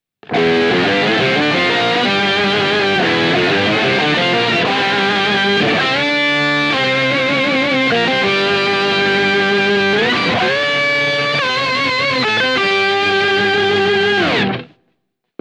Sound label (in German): Walrus Audio Luminary 12 Uhr Flutter off